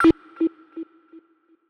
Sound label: Skid; Cymatics; Mystery Pack Vol 4